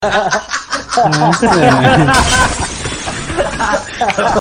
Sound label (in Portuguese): risada geral